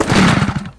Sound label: sputter, spin trap; hl2